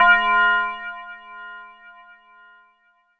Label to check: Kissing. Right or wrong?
Wrong